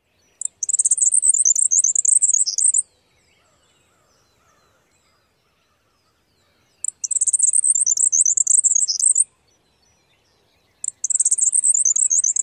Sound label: jumbled song